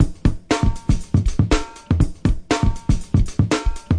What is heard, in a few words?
120 strangely happening lick, DRUM LOOPS and BREAKS